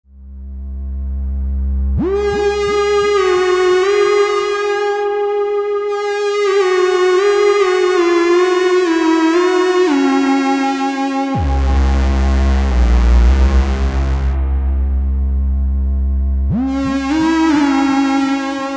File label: snv dirge; Thomas Henry; SN-Voice